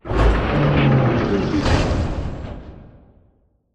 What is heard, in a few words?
Robot Arm Tilt Down Close; Boomtown; Titanfall 2